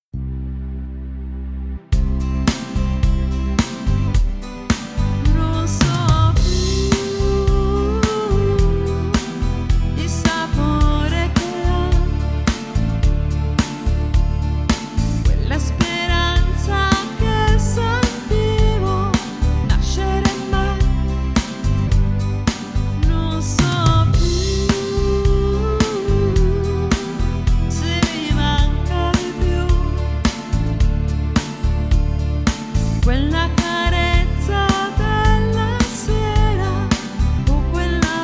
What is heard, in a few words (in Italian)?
contralto